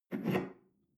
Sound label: espresso grab tablecloth slide